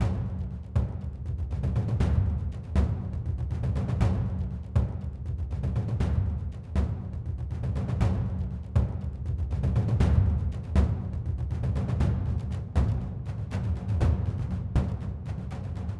Rythmic; Sifu; Corridor; H3 Corridor Phase=Phase3

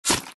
Ink Hurt; Fancy Pants World 1 Remix

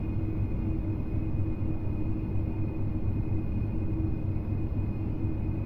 Machinery Room; hidden deep windows gamerip 2022

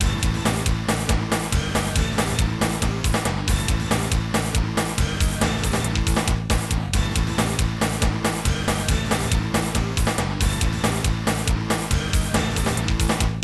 Winning; marvel super heroes; arcade; SHUMA-GORATH

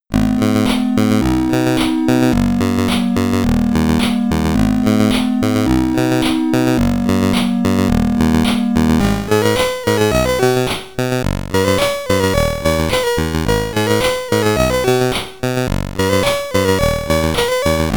Dungeons, SID Stereo; Wizardry The Edge; commodore 64

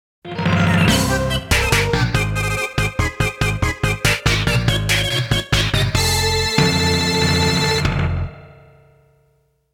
Fanfare; Yuke Yuke Troubler Makers; Mischief Makers

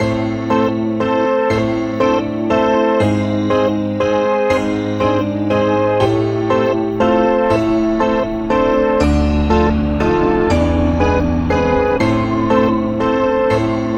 Halloween Loop; Blush Blush; Android; macOS; Mobile; Windows

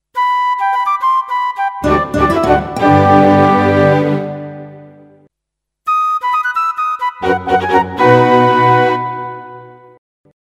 Victory Flourishes; Swords and Sandals Pirates

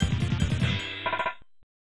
Lottery Win; Cameltry; Taito B System arcade

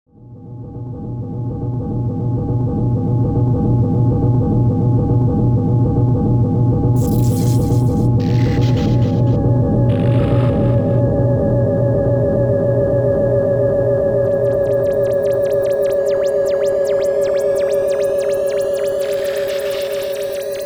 Ambient - Climbing Thunder Tower; mother 3

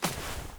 Panel Towel Grab; Among Us

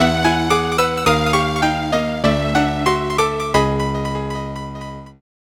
Battle Draw; super bomberman; snes

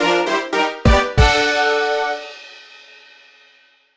Destination Wheel Jingle; Chibi-Robo! Zip Lash

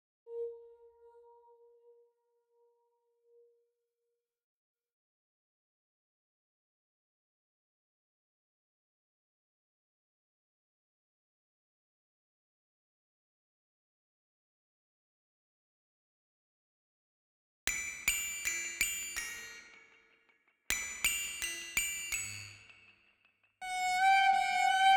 Combat Loop; DLC HarleyPolice

